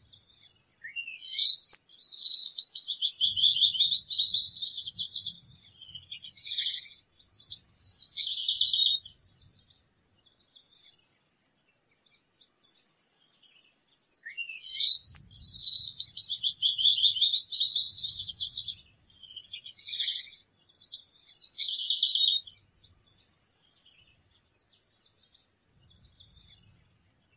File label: gouldian finch sound